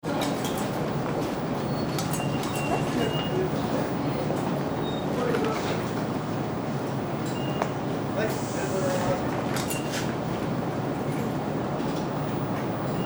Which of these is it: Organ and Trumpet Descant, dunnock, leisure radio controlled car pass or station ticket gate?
station ticket gate